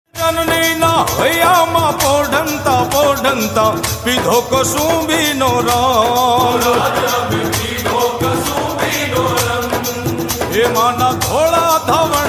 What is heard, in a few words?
gujarati ringtone